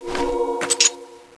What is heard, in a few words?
wing gun draw